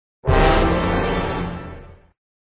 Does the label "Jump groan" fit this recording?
No